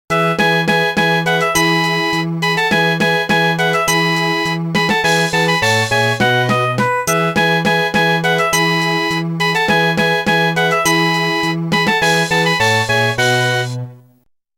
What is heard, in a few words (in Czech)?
melodie